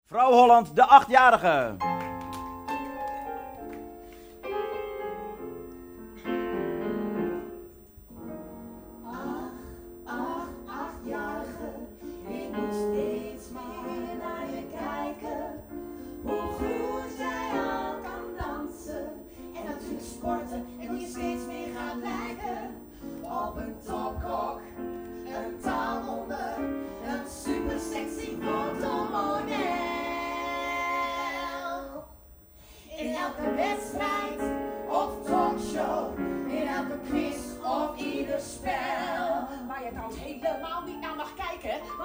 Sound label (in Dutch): Vrouw Holland lied